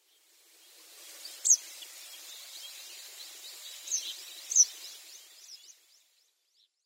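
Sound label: Eastern Yellow Wagtail; koe; kimayutsumenaga; yonaguni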